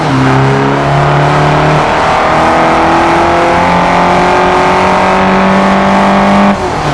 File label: third, vehicles; 242turbo